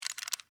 Skeleton Key Remove, From, Door, Lock; Foley; Jangling Sound Effects

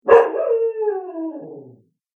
Dog Bark Howl; animal

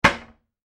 household cupboard door close kitchen; Cabinet Doors; McKinney Sound

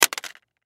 Cell Drop; Cell Telephone Opened Drop Cement; Technology; Cell Phones; Blastwave

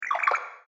Strange Knock And Squiggle Sound Cartoon Sfx

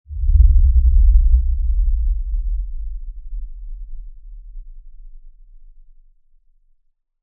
Impact Lfe Tremor Slow Fade Descend In Pitch; Blastwave

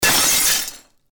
Glass Break Pane; Pane Of Glass Break , Loud And Dense; Breaking; Objects

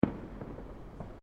Fireworks Single Firecracker; Fireworks (Sound Effects:Backgrounds); Crafted Signals

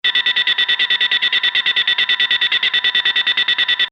Beeps Data Transmission Technology

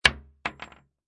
Bullet Shell Drop Machine Gun Hollow Wood; Machine gun empty shells fall on hollow wood; Impact Sound Effects

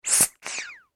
toy duck rubber squeeze; Toy Animals; Toys

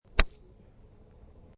Nine Iron Shot, golf; High quality stereo recording of a nine iron shot.; Sports

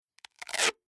Duct Tape Strong Tape Pulled Off Reel Rip; Machinery; Tom Hutchings

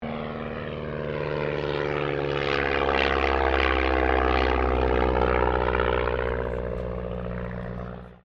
AIRCRAFT DH82A DEHAVILAND TIGERMOTH PASS; RAAF Tigermoth; Airplane Sound Effects; Vehicles

